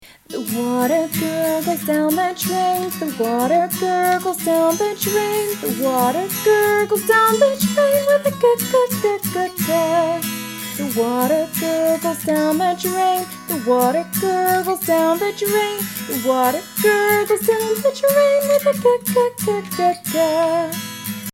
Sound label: G song, abc with phonics